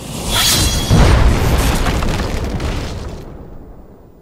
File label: ground Break